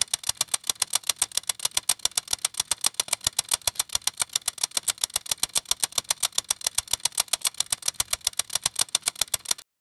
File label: mechanical logic puzzle c